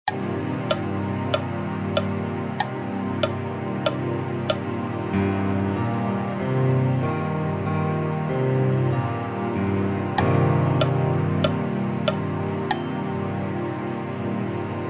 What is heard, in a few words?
min7 inv